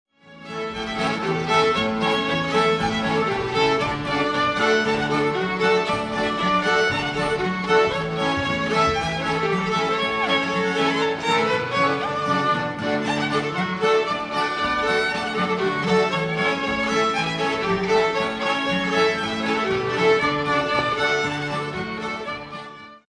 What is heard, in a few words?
SALTARELLO ROMAGNOLO; Canti Popolari Italiani